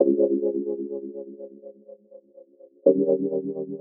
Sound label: 126 G# Eb music, Funky Underground; Loopmasters; Funky Underground Vol 2